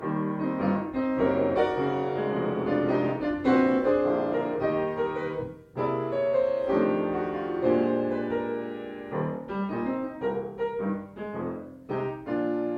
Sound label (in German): Klavier